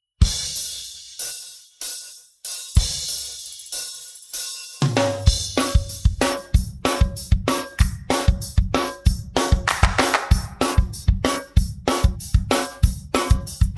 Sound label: pattern, drums